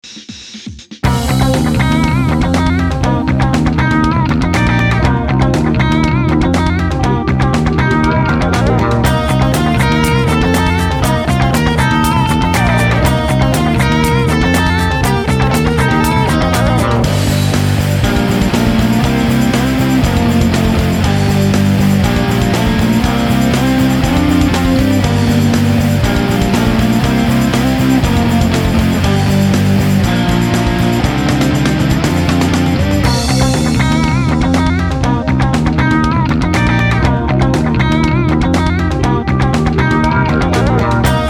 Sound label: Funk Rock; Cues for Film and TV